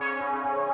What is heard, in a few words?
Owl